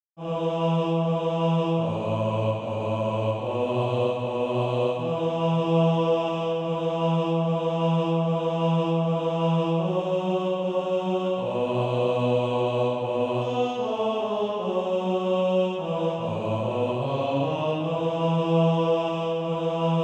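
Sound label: bas, Practise Music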